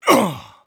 vce effort throw

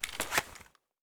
grach mag pull in; Escape from Tarkov

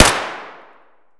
pb indoor distant; Escape from Tarkov

